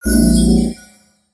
Heal Armor; Kight-samurai

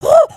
freak out, emotes

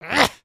Attack, creatures organic

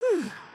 sleep turn; emotes

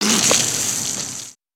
Tiki Torch Water Spit

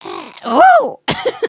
trip, emotes

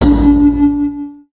Yar Har

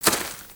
Footstep; Lego; Strombie; creatures organic

